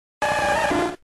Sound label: Squirtle Cry